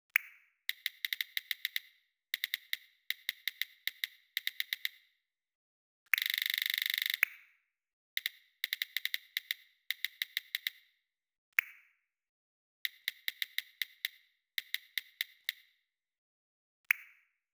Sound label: iphone style keyboard sounds; User Interface